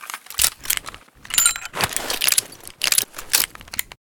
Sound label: taurus reload four